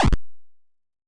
dirt, 8 Bit Videogame; LO FI and 8 BIT KITS

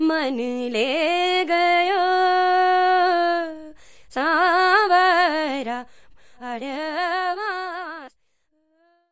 indian female vocal, singing

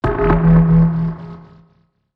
wobble synth

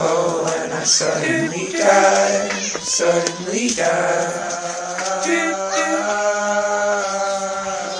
oh when i suddenly die vocals, Folksy

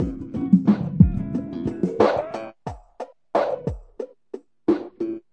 090 super phasing guitar drums; SAMPLED MUSIC LOOPS

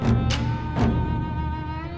121 mideast suspension break; WORLD LOOPS

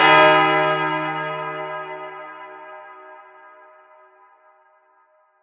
church bell

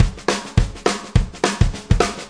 ballroom beat, DRUM LOOPS and BREAKS, 209 bpm

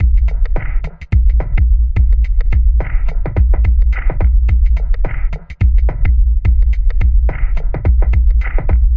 107 dark and doom break, DRUM LOOPS and BREAKS; 101 to 110 bpm

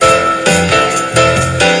133 piano lick; MELODIC LOOPS; SAMPLED MUSIC LOOPS

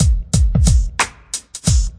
090 bpm swingin kick clap

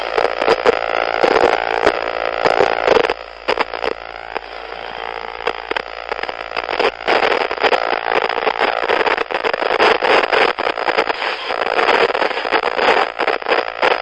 crackly radio static; Radio FM synth type stuff

